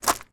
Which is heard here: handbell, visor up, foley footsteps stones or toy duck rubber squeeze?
foley footsteps stones